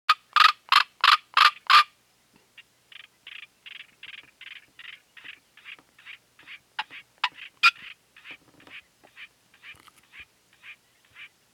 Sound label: Afrotis afraoides call